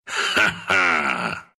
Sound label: laugh, ru; dota2